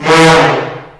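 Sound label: FALL, brass; AKAI S-Series CD-ROM Sound Library VOL-2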